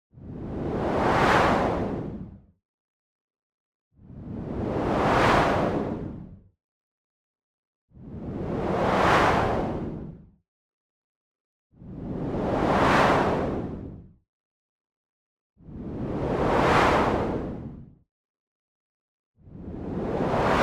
movement long, white noise medium plain; boom library; Anime Essentials

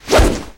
Big Whoosh Quick; SFX Kit; Foley - Misc

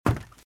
Footsteps - Wood - Creaky - Run - Left

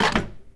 phone pickup; DeadFright

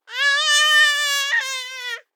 BABIES SHOUT Girl 12 months Mid; Baby Boom

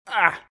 hurt; RadwinIsland

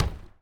Street Ball Glass Back

